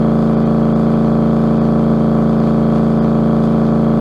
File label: Engine Muscle Rpm Med; boom karts multiplayer kart racing android